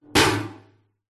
metal container bin empty set down on concrete mic inside pov; zapsplat